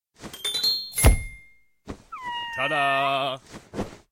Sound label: magician makes cat appear; Delicious Emilys Wonder Wedding